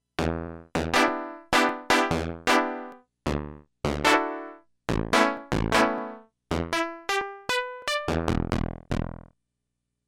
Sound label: Pulse Comp